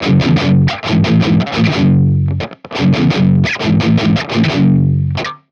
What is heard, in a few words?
Metal Guitar Loop, 174 BPM F# Maj; Cymatics; Vibrations Guitar Sample Pack